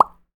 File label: Mouth Pop